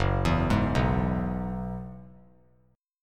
Gb 9th Suspended 4th Gb down, chord; Gb9sus4; arpeggiated; JGuitar

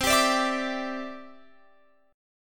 C Major Add 9th, C, down, Guitar, Open F; strummed; Cadd9

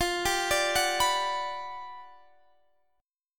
G Major 7th, F; Listen to GM7/F arpeggiated; GM7/F Chord

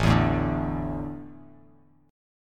A Suspended 4th; Asus4 strummed; JGuitar

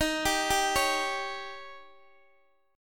Eb 7th Eb, Guitar DADGAD; x,x,13,12,10,11; down

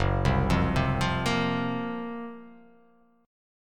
Gb Major 7th Flat 5th, GbM7b5 chord; arpeggiated; JGuitar

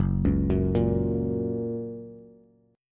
Gb Major, Bass; Gb arpeggiated; JGuitar

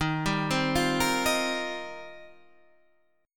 D sharp 6th Add 9th, Guitar, DADGAD

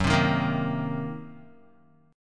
Fsharp 6th Flat 5th down Guitar; strummed; JGuitar

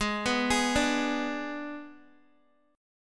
Ab Diminished Ab Guitar; arpeggiated; x,11,11,13,14,x

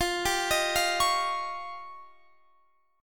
F 7th Suspended 2nd Sharp 5th

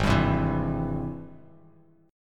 G sharp Augmented Major 7th G sharp Bass Standard; G#+M7 strummed; 4,10,10,9; JGuitar

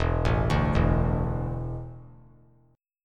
E 7th Suspended 4th; E7sus4 arpeggiated; 4,4,5,2; JGuitar

